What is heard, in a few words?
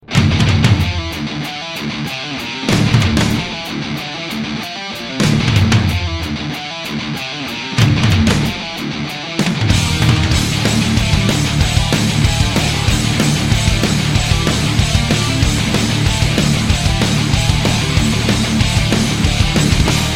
heavy metal